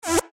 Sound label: Laser Filter, science fiction sound effect; Laser Sound Sound Effects; star wars / trek style